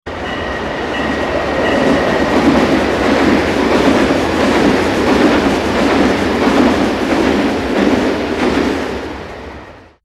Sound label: Train passing